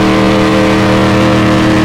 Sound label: engine, cars; vdrift